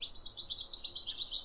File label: bird